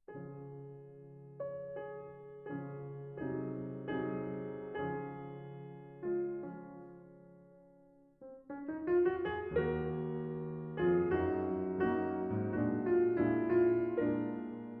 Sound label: adagio in d flat major